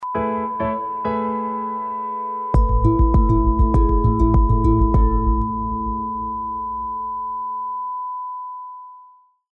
Jingle

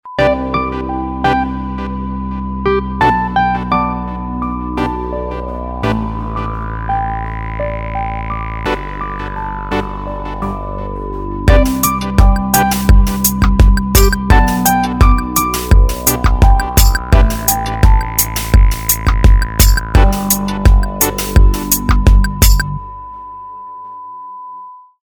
air groove

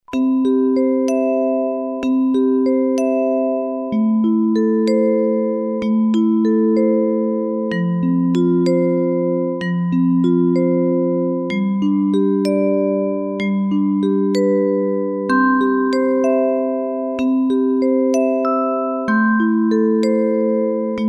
MUSIC BOX

